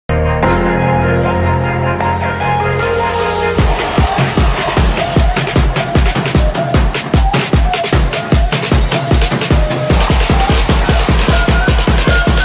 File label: Trap